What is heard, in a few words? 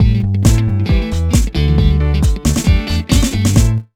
BGM Announce Frantic Challenge; Foto Frenzy Spot the Difference 2009